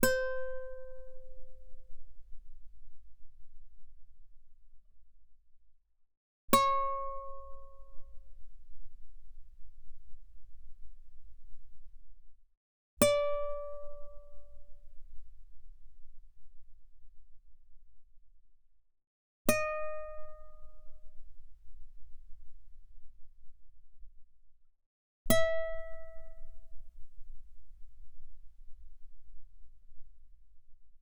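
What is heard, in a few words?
Guitar fortissimo sul E C5 Bb5; mono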